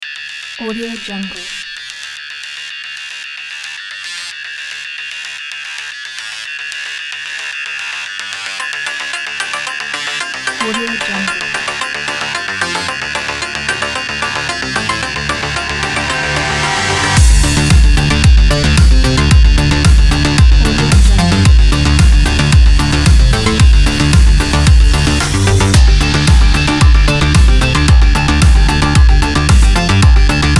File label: aggressive electro sport